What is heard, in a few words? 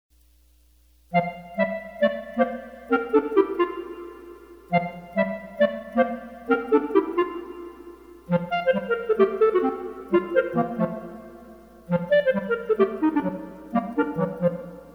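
Calypso Flair 2 clarinet; Clar Duets Vol3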